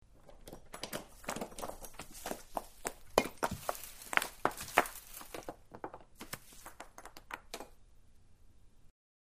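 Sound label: Ceramic Rock Movement, rocks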